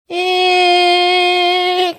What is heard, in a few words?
making sustained funny sound